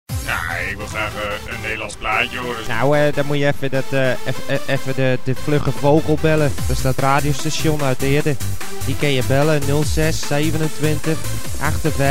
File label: Jingle; Audio Fragmenten; Arkan Radio 2